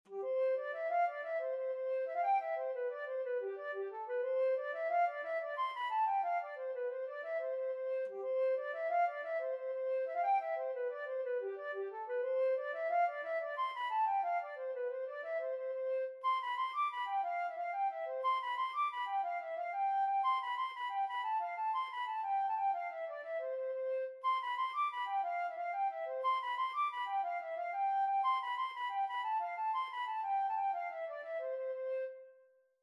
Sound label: flute